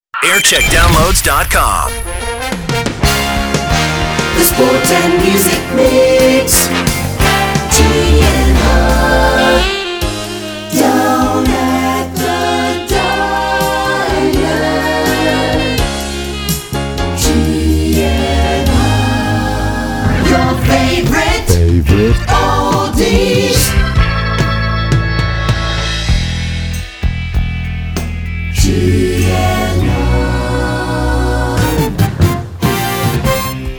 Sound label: jingle montage